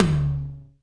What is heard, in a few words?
Tom Hall Hi